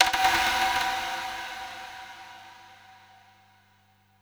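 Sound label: percussion, drum & bass, 140 BPM; Ueberschall; Drum & Bass Resonance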